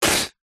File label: human spit blow water spray